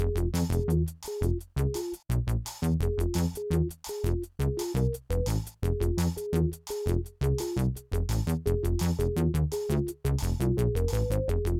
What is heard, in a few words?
Low; Plants vs. Zombies Battle for Neighborville; DreadWoodQuestOneMain